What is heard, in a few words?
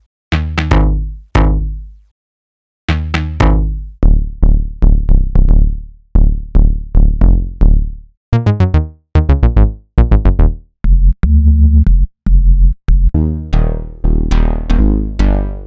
Synth Bass